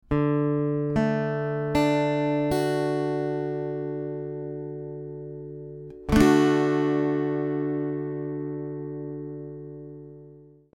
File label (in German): d dur